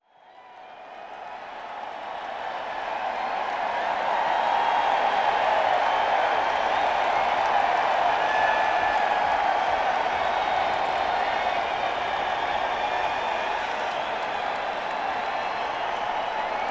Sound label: Crowd, Boardwalk Hall; Atlantic City, NJ